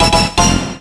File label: Jingle Time Left 30 sec